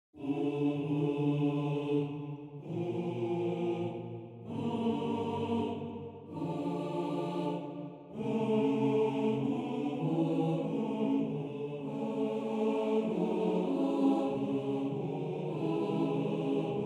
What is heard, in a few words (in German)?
Modulation tutti